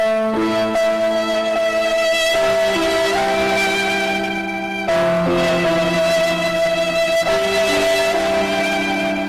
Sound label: sad violin; mlg